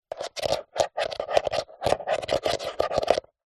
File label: household mains power plug fumble scrape on extension block trying to locate socket